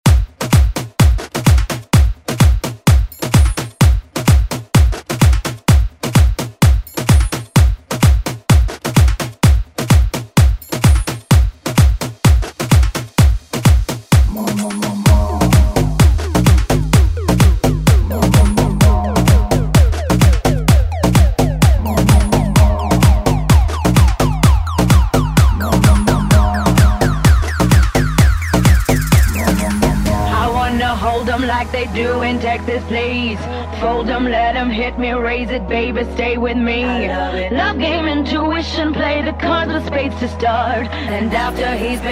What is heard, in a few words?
guaracha; Deejay Hit; Hit Guaracha Edit